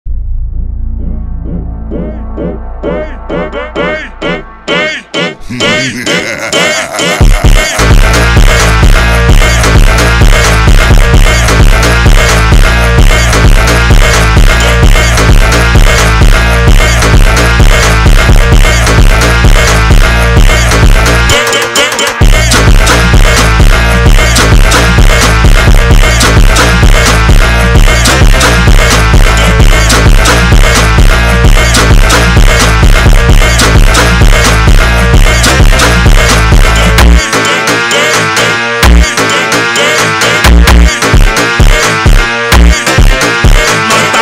Phonk funk

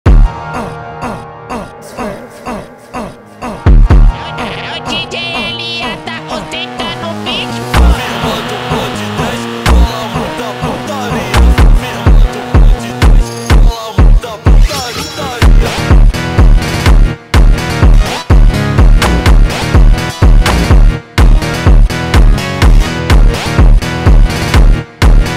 automotivo

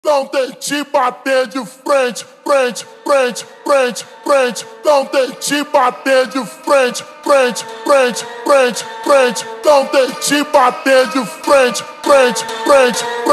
Phonk Super Slowed

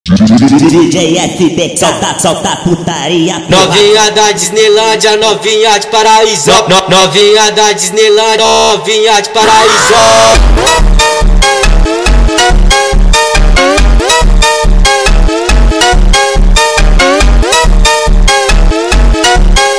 Phonk

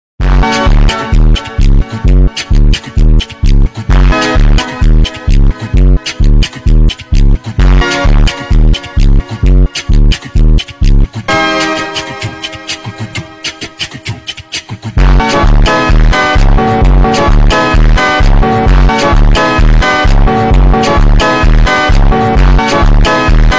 Phonk Automotivo

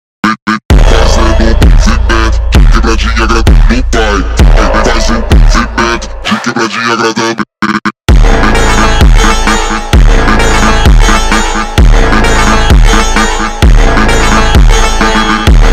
Phonk Montagem

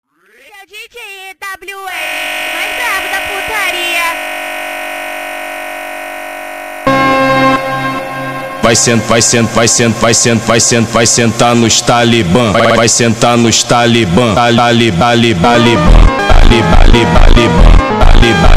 Phonk MONTAGEM

